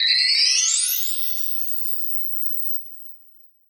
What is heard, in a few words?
Twinkly Power Up